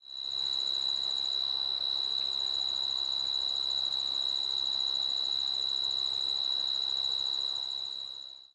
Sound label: ambient cricket; STALKER